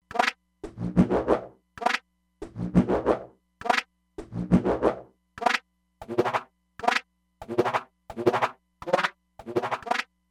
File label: Catch Rhythm, E-mu Proteus-3; Factory patches